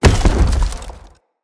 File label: Blunt Heavy Impact Wood, Weapon Impacts; Player; Environment